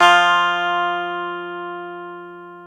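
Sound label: E 12 String guitar; Club-50 - Foundations Roland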